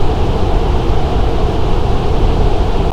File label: ship engine; yage